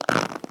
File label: Hub-Train Strap Squeak